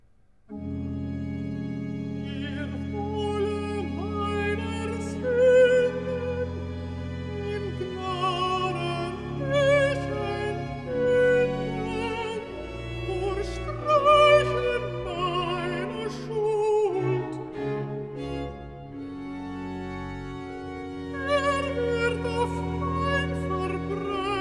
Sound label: Recitativo Alto; Bach Johann Sebastian; BWV0097; In Allen Meinen Taten; Er Wolle Meiner Sünden; Kantate Ohne Bestimmung